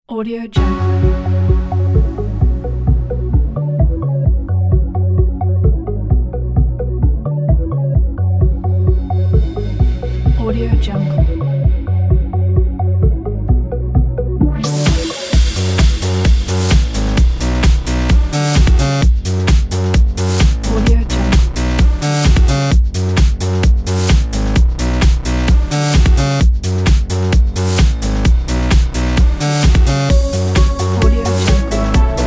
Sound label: cardio dance